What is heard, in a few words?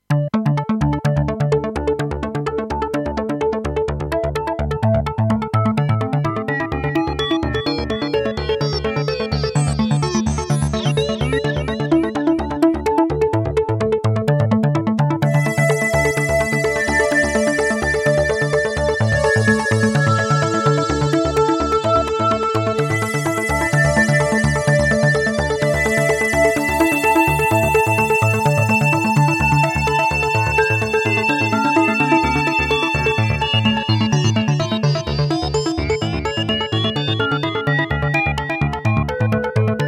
Arpeggio Split Lead